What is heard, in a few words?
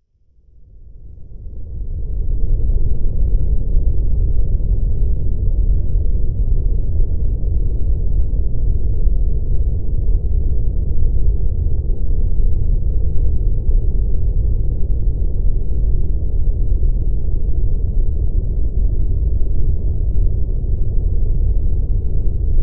Spooky Noise